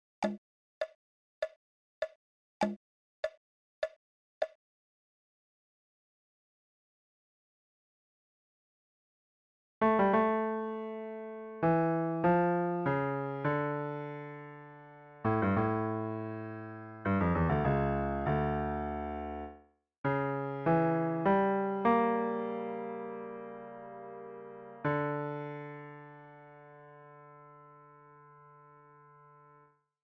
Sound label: Toccata Fugue Dm LH